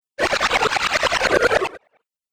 Wacky Water Video Game

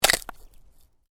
Ice: short crack of ice under pressure

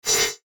FLASH ELEMENT HIGH TECH; Multimedia; Blastwave

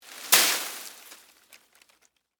Foliage Drop Move, Palm, Frond; Texture; Rustling; BlastWave

